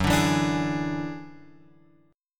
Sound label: F sharp 7th Suspended 2nd Sharp 5th F sharp; F#7sus2#5 Chord {2 5 2 X 3 4} strummed